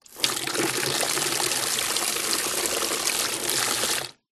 household water pour from hose into plastic bucket